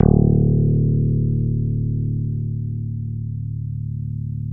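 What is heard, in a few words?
GTR D1 STIC, KEYS AND GUITARS; Synclavier Sampler Library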